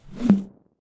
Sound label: bubble end; astro Legends Lyra and Erion Desktop; Foxium